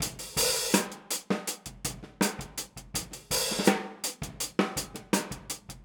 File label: Boogaloo, Hi-Hat, 82 BPM; Drums; Verse; Sonic Foundry; Drums from the Big Room The Mixes